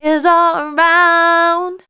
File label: voice spectral, ly short